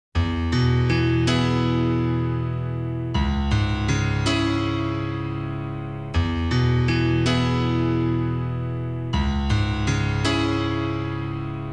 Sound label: Piano Song (instrumental)